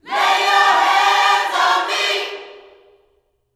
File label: LAY HANDS, Vocal Planet Gospel